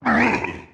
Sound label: injured